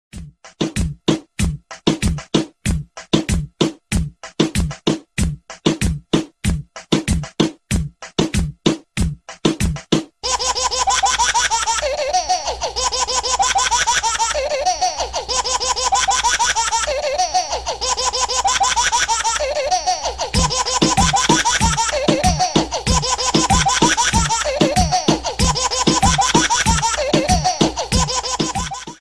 musical ringtone

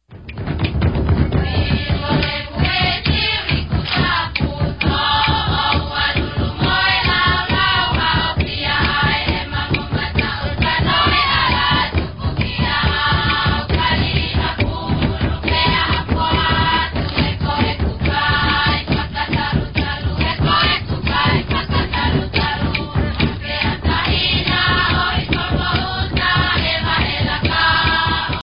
african chant; ringtone

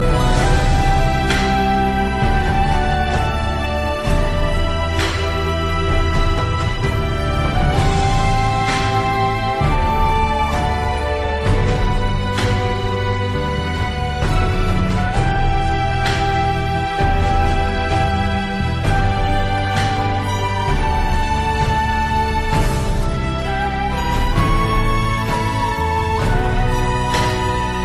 tai chi music, ringtone

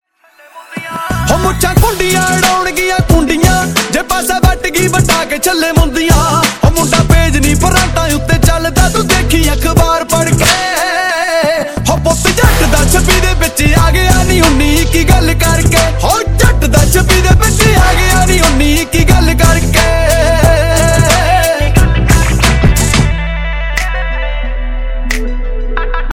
song ringtone

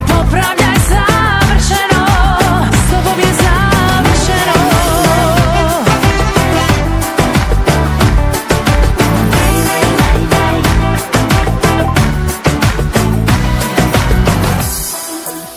a croatian song

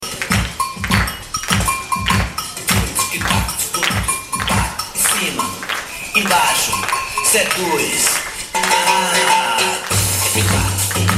latin oriental music ringtone

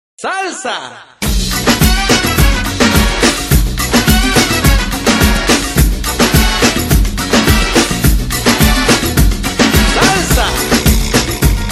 salsa, ringtone

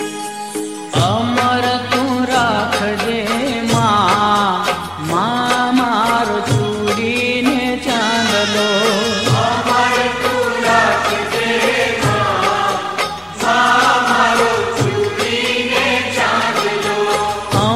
gujarati garbo

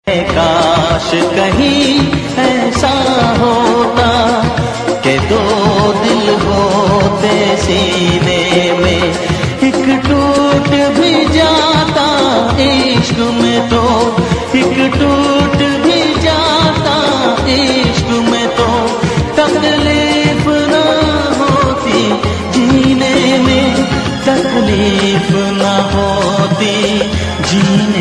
sad ringtone

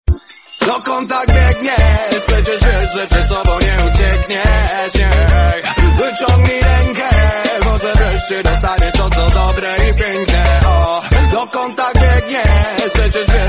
polish reggae

